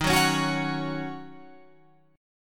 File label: D sharp Minor 7th, Guitar, Open F; D#m7; strummed; 15,13,13,13,16,13; chord shape